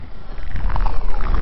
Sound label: loop, techno atmos